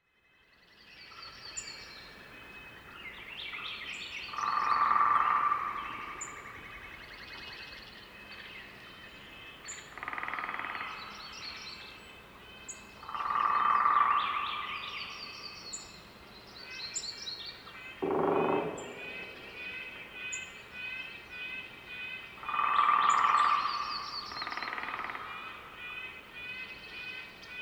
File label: Sequoia Forest Woodpeckers